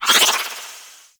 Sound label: Arch Wing Prime Dodge Roll Right; Warframe; Vehicles